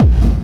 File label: hard bass drumz